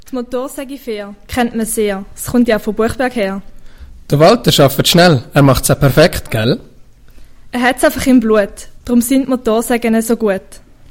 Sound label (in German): Jingle; Motorsägen Fehr